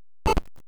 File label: tv remote; vsensor